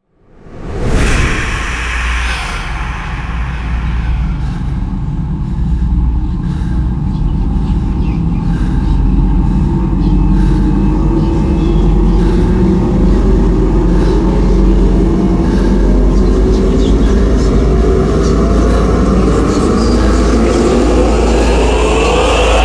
riser voice of doom

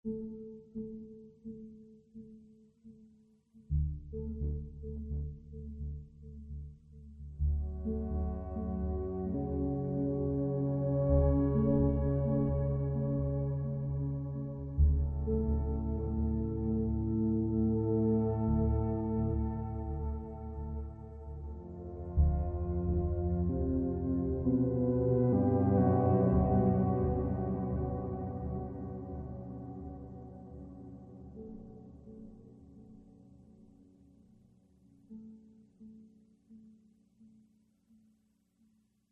ambient

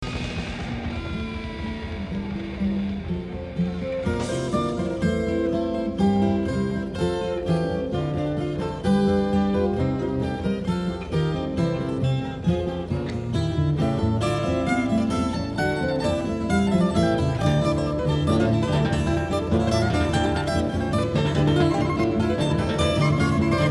excerpt from guitar concerto

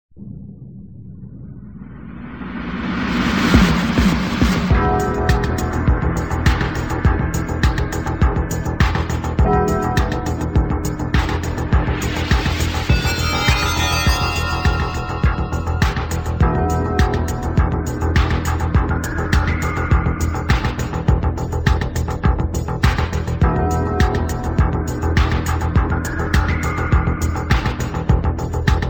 instrumental

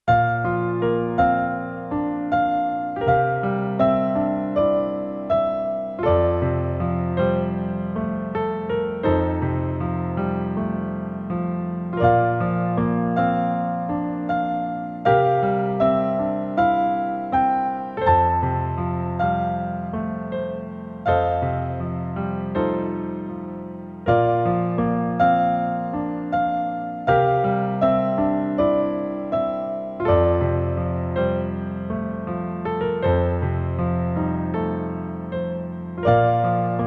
piano arrange

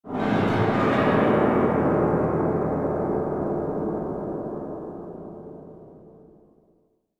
piano roll

